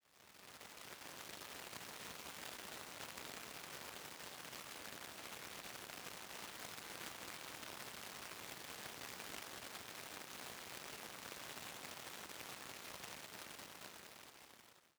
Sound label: heavy rain interior